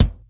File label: DRY K, drum; Crypton; DrumBase5000